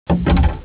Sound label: paddle Hit